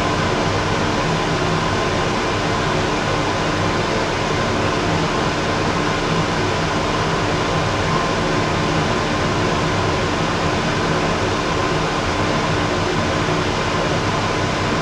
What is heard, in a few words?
Extractor Loop, Extractor Fan Kitchen; Extractor Fans